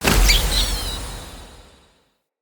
Stomach Exit Stinger; Floppet; Bromius Quest; Neathuns

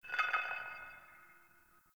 Exterior Man Hole Drag A; Warframe; Ambience; NineteenNinetyNine

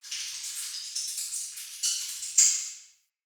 Cave Ocean Creature Close

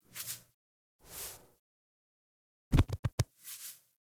Puppy Foley Stand Idle To Sit Idle; Warframe; Kubrow

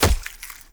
Raw Meat Impact; Warframe; Awakening Remaster

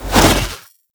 Melee Whoosh; Warframe; Sentients; Sentient Trooper

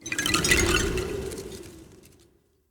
Job Board Open

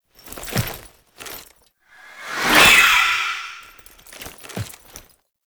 Idle Agile Bow; Warframe; Dagath

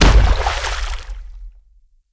Infested Femur Step Water

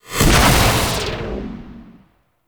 Augment Blast; Warframe; Warframes; Augments

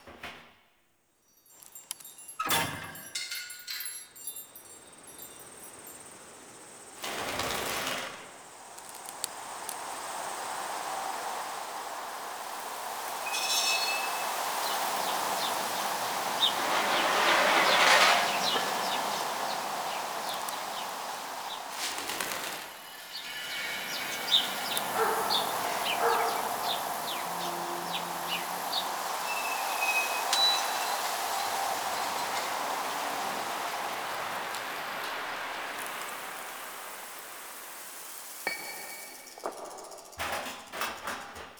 Summer Exterior Large Bed Loop, Ambience; Warframe; Nineteen Ninety Nine

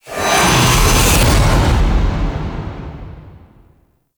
Beam Swipe Cast; Enemies; Abilities; Ethereal; Warframe; Zariman; Void Angel

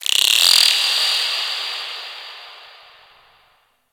Underwater Critter Small, Ocean; Warframe; Grineer Ocean

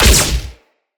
Flak Explosion Small; Eximus; Blitz

